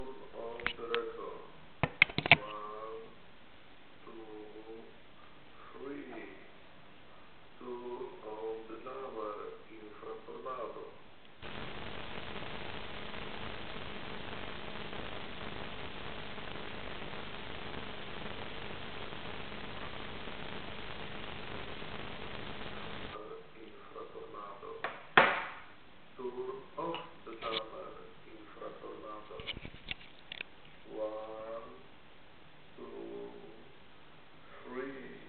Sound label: recorder real test 2m; INFRATORNADO; velleman